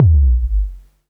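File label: BD Sub, bass drum; Club; VEC1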